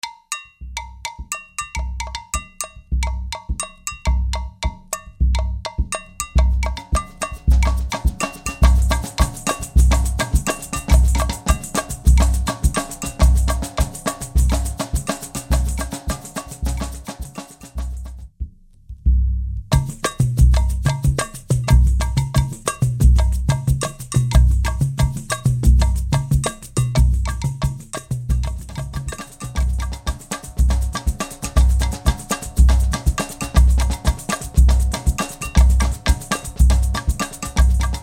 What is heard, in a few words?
Samba Partido Alto